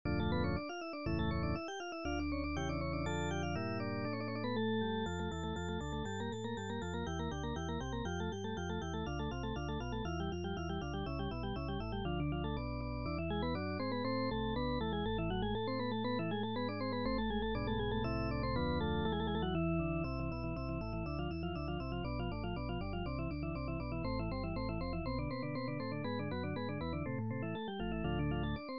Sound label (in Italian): allegro in re minore; martini giovanni battista